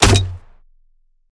weapon; Default Noob Tube

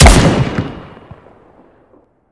weapon; Tavor SG

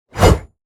swsh

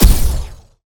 weapon; Arctaurus

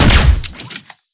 weapon; EX7 Cybernetics

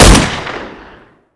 weapon; Takanomei's Myth; Akimbo Pistols

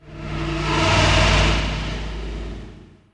ghost death; soul sanctum(old raid) ghost sound

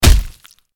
melee; combat banana

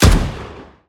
weapon; Toonwave

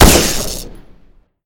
weapon; Sharkbyte

